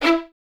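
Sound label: Violins E 4 staccato left; Miroslav Vitous; String Ensembles; 11 Violins; 11 VS Stacc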